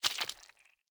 step gore; DeadFright

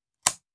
Machine Gun Foley; Sound Ideas; Guns

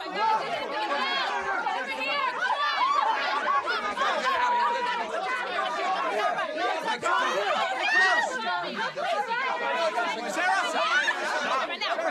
Crowd Panic Walla; Blind Drive

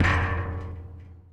Street Ball Metal Rim, Street Basketball; SFX Kit

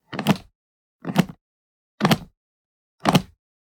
Generic Button Wood Medium; boom library; The Interface

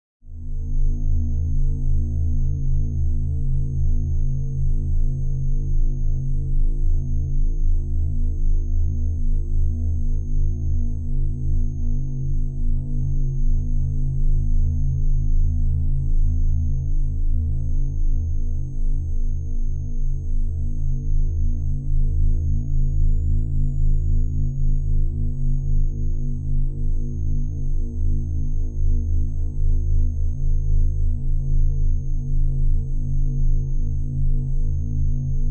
ambience scifi; NCH Library

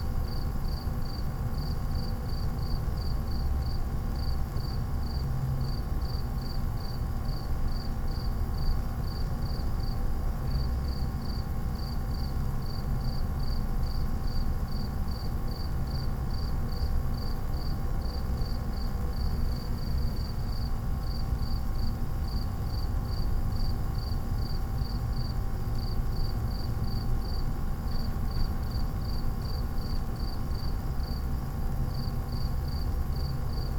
Urban night electricity cricket; urban and suburban; boom library

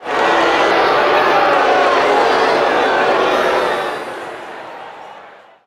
football crowd boo; Crowds

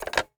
Telephone Rotary Receiver Up; Communications; 1001 Sound Effects

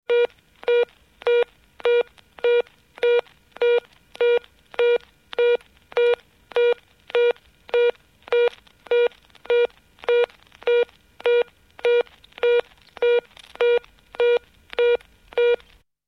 Telephone, European Busy Signal, Foreign; Office; Sound Ideas; Series 2000

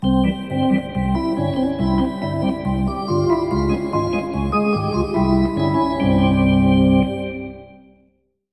Hockey Organ

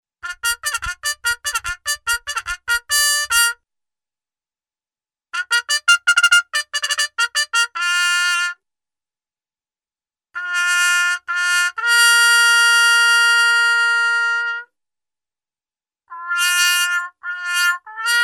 Muted Trumpet Calls; Cartoon; Hollywood Edge; Cartoon Trax

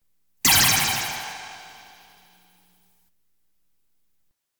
Science Fiction effects, Lasers, Light beams

